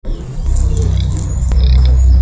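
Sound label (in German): knäkente; see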